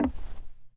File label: wood footsteps; zps; Foley